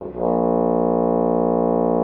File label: FR HORN A#1; Xsample VOL-3